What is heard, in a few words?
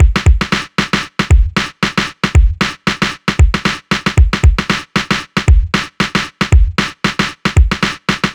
115 Big Roller, Breaks; loops; 115 BPM; Loopmasters; Jon Carter & Alex Blanco; Block Party Essentials